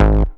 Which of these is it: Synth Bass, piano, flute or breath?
Synth Bass